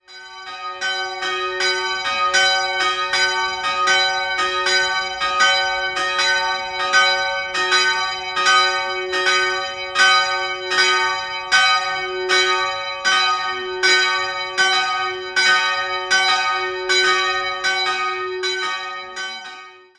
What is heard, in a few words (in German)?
Kelheim Matthaeus, Glocken